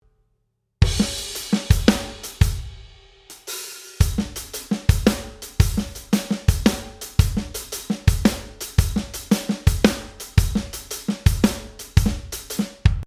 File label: drum